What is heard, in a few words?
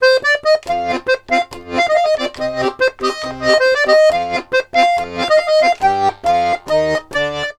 140, Complete Accordions; USB Soundscan vol.40; AKAI